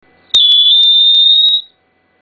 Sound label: Lie Down whistle